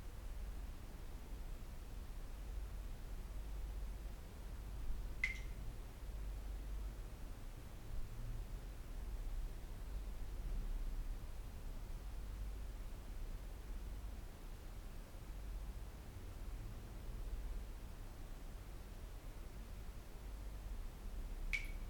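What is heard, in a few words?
Ambience Bathroom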